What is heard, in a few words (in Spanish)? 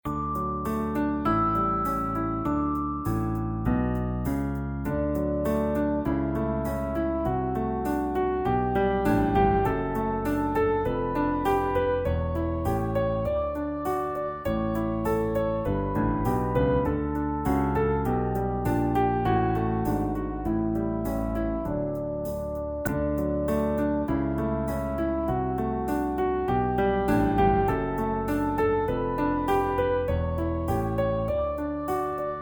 Escala de Re Mayor, violin; pistas de audio para violin